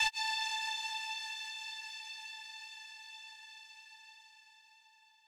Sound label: lead3